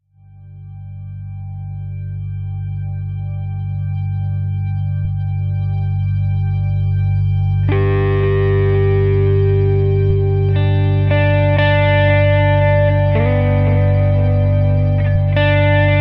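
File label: G 95 guitar impro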